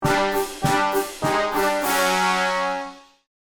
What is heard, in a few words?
Fanfare you lose fail game fanfares; misc musical